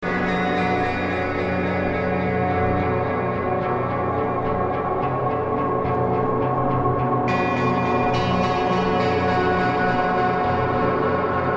guitar drone; VOD records

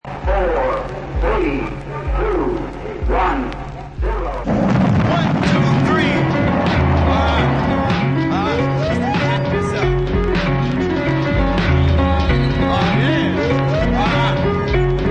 Strange Piano

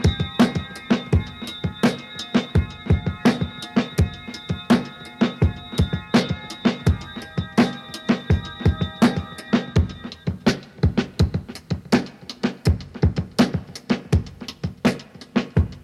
Telecinesi (drums)

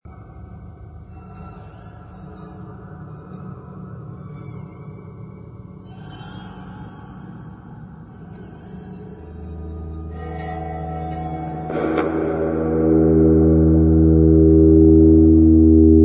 aged drone

